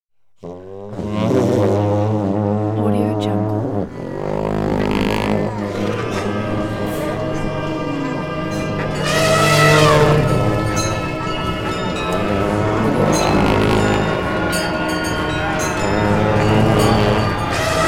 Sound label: music far east sauerkraut band; music weddington